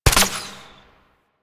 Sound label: Tranquillizer Rifle Shot; Warframe; Tenno